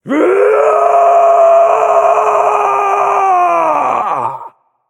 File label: battlecry; smite; arthur